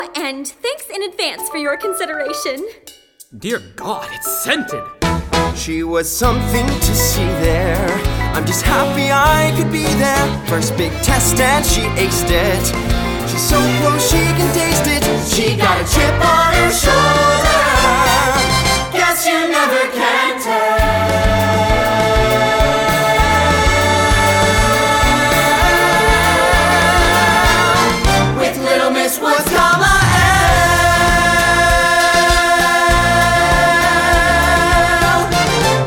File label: Practice Track vocals